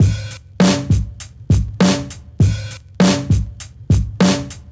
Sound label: drumbreak loop 100; Hiphop